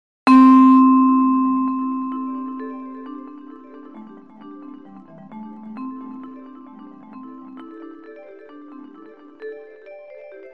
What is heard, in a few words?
4 beat fractal tune; fractal music; Sloth canons